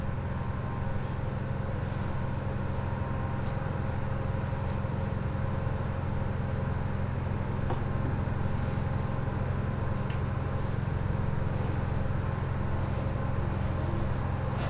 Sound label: Silent Street Ambience Tone